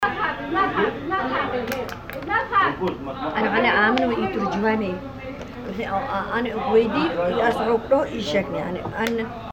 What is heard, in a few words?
restaurant sounds